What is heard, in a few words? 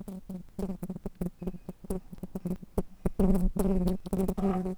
Pascuorum workers, bumblebees